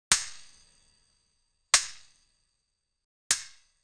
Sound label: stick hits fire ghost